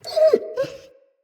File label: Wolf Cub Trapped Call Close